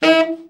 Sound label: BRA TENOR SN, SAX